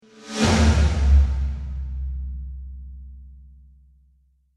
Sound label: Trailer Hit Swoosh; Miscellaneous Impact Sound Effects